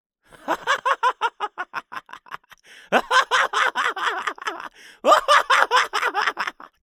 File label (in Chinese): 狂笑, 中年音, 男